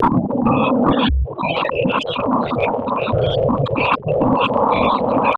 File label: ALIEN RADI, AMB